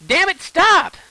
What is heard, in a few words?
hit